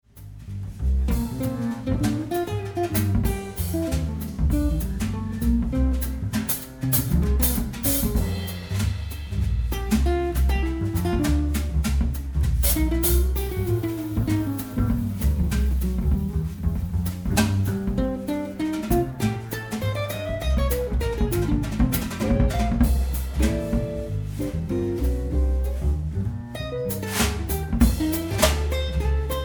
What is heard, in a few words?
archtop guitar; Ben White Playing an 18" Engelmann Spruce / Big Leaf Maple Archtop